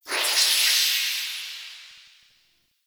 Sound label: Spawn Machine Item Disintegrate; Echoes; Warframe; Entrati